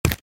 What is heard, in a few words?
Footsteps on street shoes single step